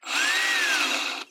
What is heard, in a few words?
drill rev; SoundsCrate